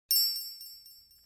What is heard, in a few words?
bell, christmas